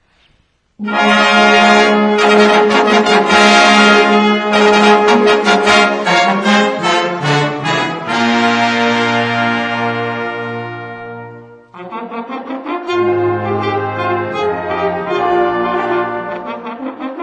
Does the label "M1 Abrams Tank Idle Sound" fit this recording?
No